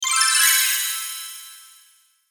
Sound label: Shimmer Twinkle High Reverb; Magic; Blastwave